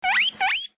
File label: guinea pig wheek